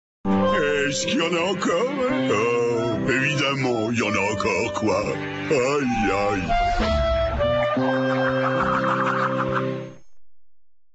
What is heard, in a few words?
jingle encore; boomerang